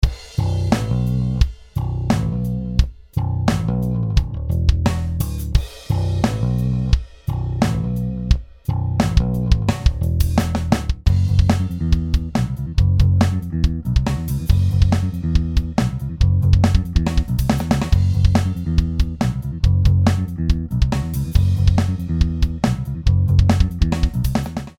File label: Snare